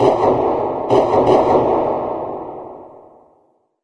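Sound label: distant pistol; random amb sfx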